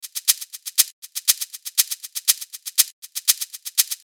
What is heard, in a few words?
shaker